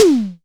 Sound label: Disco Tom - Med; Tama TS-206